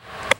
chip; Jack

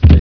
thud

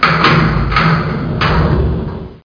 jail open; DOOM

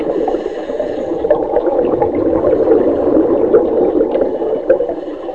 scuba; REDNECK; RAMPAGER

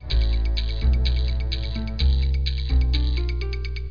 music; Egoboo

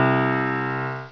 Piano; Sound Library 2000; Wayzata Technology

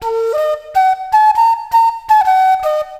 flute, ethno; eJay

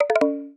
ALERT

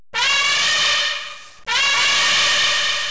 Elephant; ICQ 2.0b

